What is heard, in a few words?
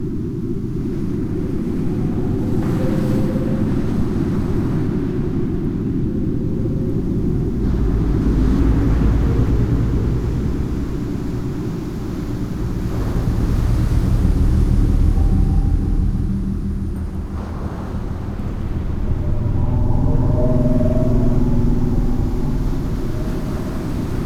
Universe Wave Whale Background Atmos, Earth: waves, whale song, the universe atmos: